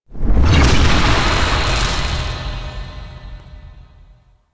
New Mind Control End; Warframe; Nyx; Nyx Updated Sounds

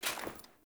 Foley Action Armour Walk; Player; Deora

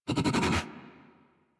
idle alert scratch irritated, Kubrow; Enemies; Warframe; Tame

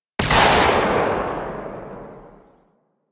Railgun Tail; Weapons; Warframe; Archwing

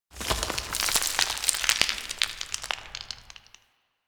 Tower Slate Slide; Ambience; Warframe; Quests; The New War; Cetus; Unum Tower

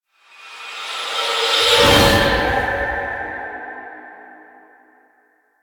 Transition Out; Duviri; Zariman

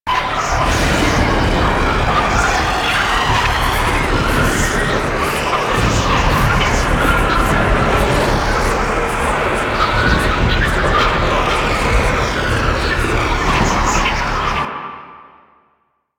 Sentient Medusa Tower Loop; Kahl Quest; Warframe; Quests; Veilbreaker

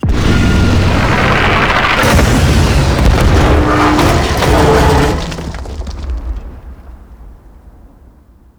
Skiff Crash Bomber Approach; Quests; The New War

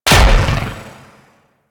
Spear Gun Explosion, Weapons; Warframe; Corpus

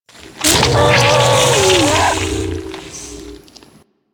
Attack, Infested; Barks; Warframe; Ancient Shell Agent

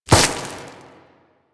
Ram Movement Start; Animals; DE; Warframe; Quests; Duviri

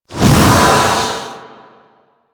Tear Spawn; Warframe; Entrati; Swarm

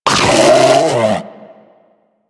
Predator Vocal Alerted, Hunting Critters; Warframe

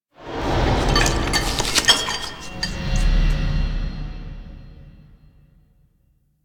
Eating Stinger; Duviri

